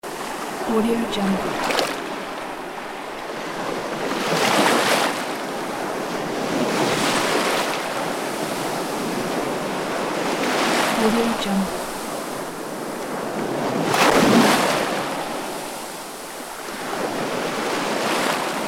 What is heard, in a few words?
rough sea; davidbeardmusicproduction